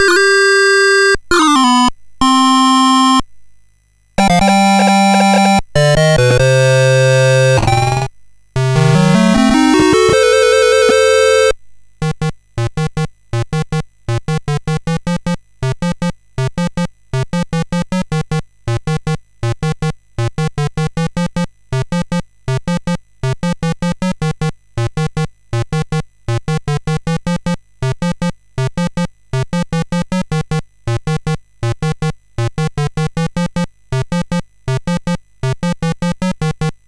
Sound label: Musicbox, sid MOS6581R4